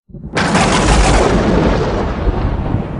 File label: lightning; minetest; lucky block